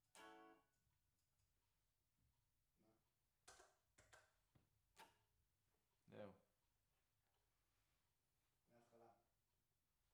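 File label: Vocal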